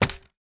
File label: wooden snap attack; mobile game action; esmteam